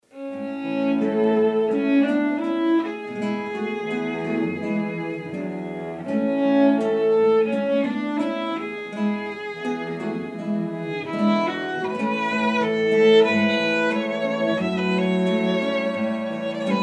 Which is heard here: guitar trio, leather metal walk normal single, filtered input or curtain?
guitar trio